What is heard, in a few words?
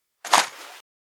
pickup; fallout 4